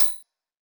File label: marimba; ゆめ2っき